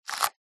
camera dslr shutter speed dial single turn canon eos 6d; technology; zapsplat